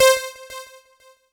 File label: C4, synth line; 90 s Sample CDs; NiceBeats; SynthLine VOL-3